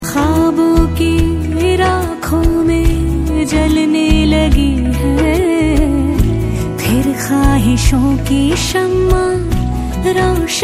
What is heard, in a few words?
Song